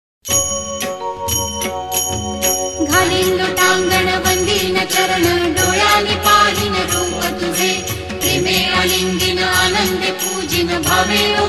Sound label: Tamev Mata (Female); Ganesh Chaturthi Special Ringtones